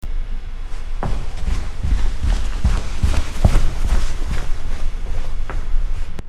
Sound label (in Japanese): ashioto